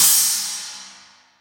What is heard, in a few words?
urban crash cymbal single shot f key, Royality free drum crash tuned to the F note.